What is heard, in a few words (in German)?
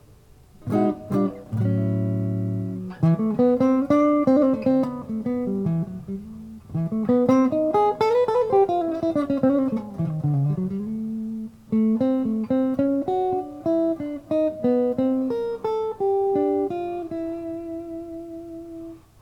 Hals PU clean Tonblende 3-4